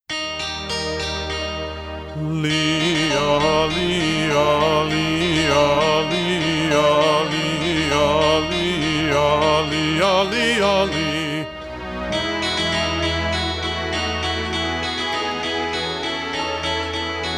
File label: Lee Yah Lee Yah Bee Yah Mee Yah